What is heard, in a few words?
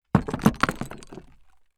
Sand Pebbles; TOOLKIT vol.2